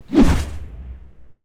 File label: Melee Swings Stereo Low Swings; 96khz; David Dumais Audio; Weapon Sounds - Weapon Swings